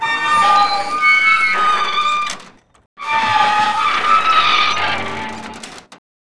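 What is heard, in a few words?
metal turn squeaky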